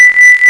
SONR LOOP; WAR

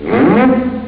SCRAPE; SkirmishPAL

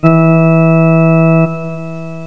ORGAN NOTE

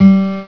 HARPSIC

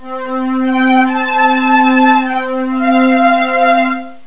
STRING

